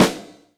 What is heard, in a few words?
Snare, Alesis DM5 Drum Machines